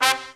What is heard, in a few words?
SECT BRASS FX